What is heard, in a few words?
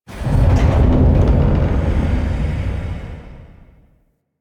Corpus Powerplant Jettison Ronk; Ambience; Warframe; Corpus Railjack; Tether Power Plant